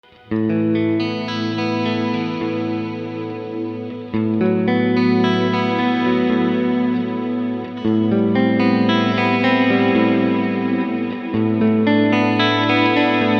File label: axe delay